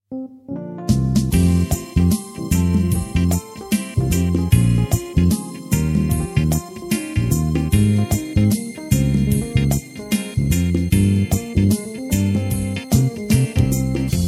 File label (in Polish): mala kapliczka instr